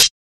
low treble pedal hi hat single hit g key

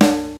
00s Natural Steel Snare Drum D Key

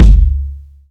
large room kickdrum sound c sharp key

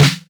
tight low end snare drum c sharp key; snare drum samples

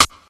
dirty steel snare drum sound D key

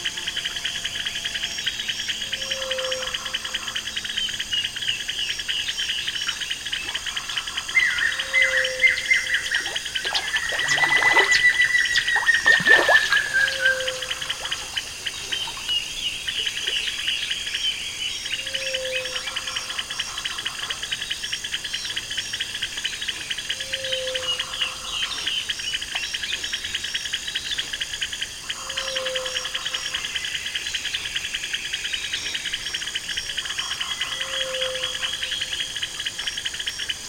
river and rainforest habitat

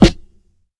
short reverb snare g key; snare drum samples

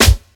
smooth steel snare drum sound e key

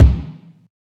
long room reverb bass drum one shot c key

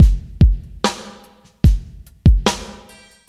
73 bpm breakbeat sample g key

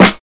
dry acoustic snare f sharp key

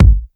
dope kick single shot g sharp key

